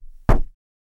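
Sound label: car hood impact; vehicle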